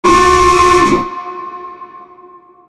lift alarm; doomsday; Garry's Mod; tf2; alarms

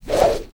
projectile swoosh; Garry's Mod; tf2; passtime

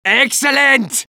cheers; medic

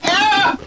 Deer Die; quitoypongo; cursed deer sonic

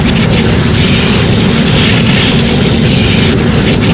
flame thrower loop crit; tf2

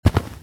step; brainrot; noobini boss

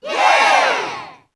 "yay"; Garry's Mod; racing tool

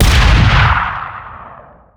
rescue ranger fire, weapons; tf2

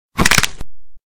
Worm Attack Sound; Garry's Mod; hungry worm 2

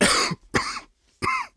cough; cigarman

